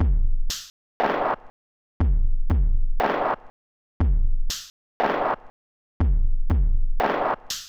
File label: beat; csound